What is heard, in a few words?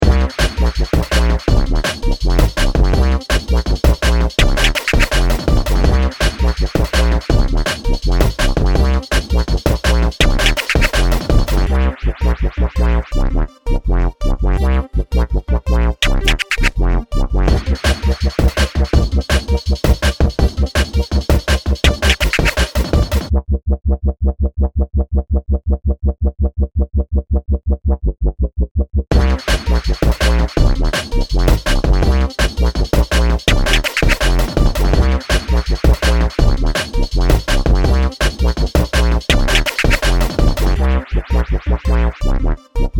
beat